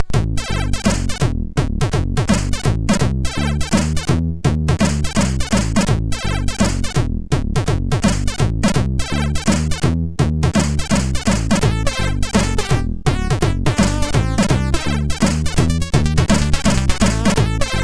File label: piano rap, MOS6581R4; sid